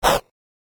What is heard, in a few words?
eat; shweets sim; snivy